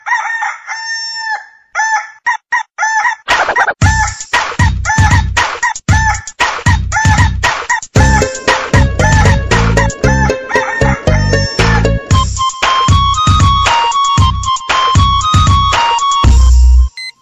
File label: Wake Up Call Mix